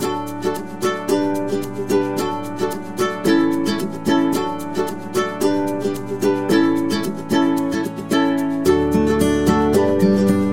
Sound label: Upbeat Happy Folk, happy music; happy music vol 2